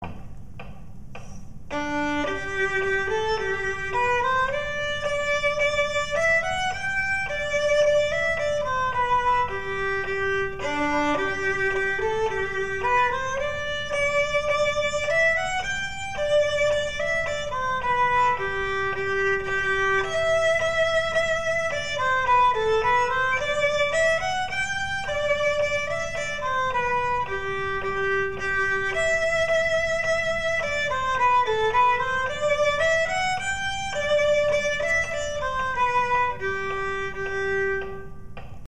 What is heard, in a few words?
english folk song fast violin